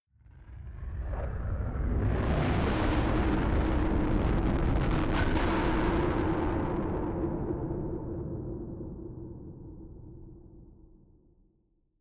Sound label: distorted blasts